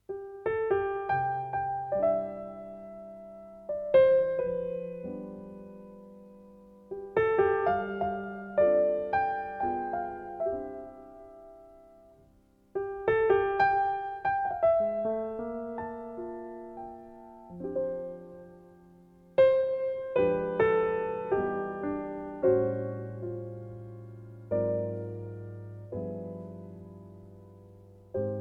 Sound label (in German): Pianoueberleitung